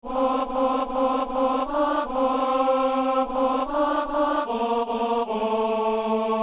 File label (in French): a tes enfants seigneur accorde ton amour, alto; Saint-Denis